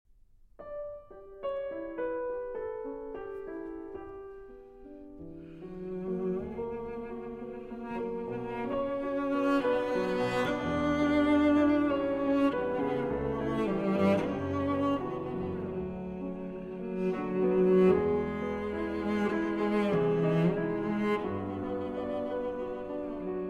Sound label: Cello and Piano; With Rue My Heart Is Laden, Op. 2 No. 2